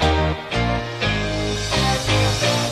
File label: guitar, Phonky loops